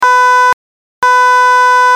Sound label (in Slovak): klakson 12v slimákový; fiamm am80s h